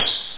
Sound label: hihat